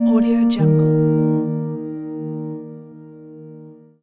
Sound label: corporate logo